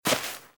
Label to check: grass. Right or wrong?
Right